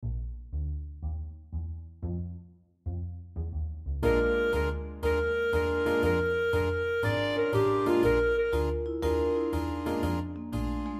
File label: swingtime beginners blues COMBO